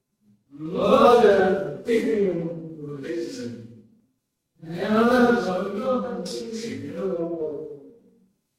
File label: crowd gasp